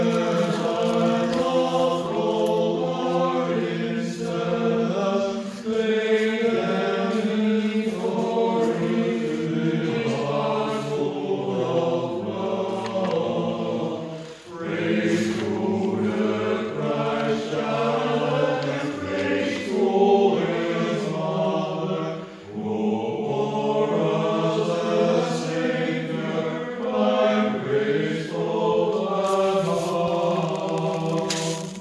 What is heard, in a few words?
Christmas Lullaby Tenor Bas